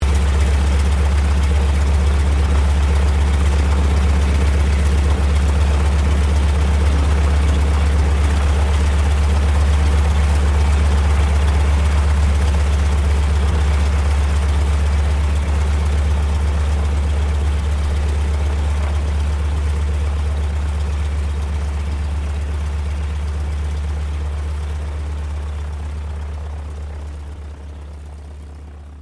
AIRCRAFT BOEING PT-17 STEARMAN IDLE TAXI, Stearman Trainer; 1939; Airplanes (Sound Effects:Vehicles)